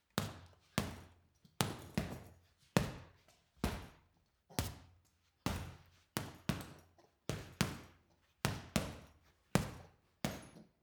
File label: boxing punching bag boxing sequence short; sport-freizeit; boxen